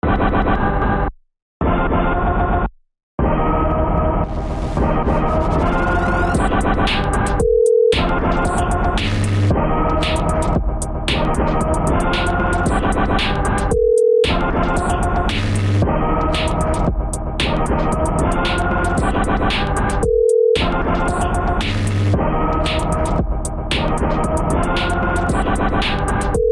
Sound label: Bloop; Friday Night Funkin' Vs. Baby Blue Brother; Running Goblin Dialogue